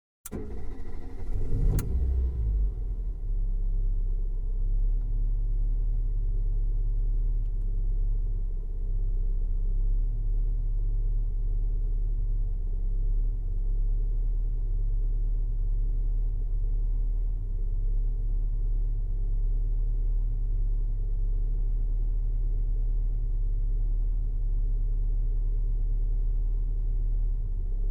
Ford Fiesta, 1.1 litre hatchback- int- switch on, idle, switch off; BBC Sound Effects Library